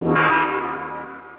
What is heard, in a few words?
gong; shout3d 2.5; Shout3d runtime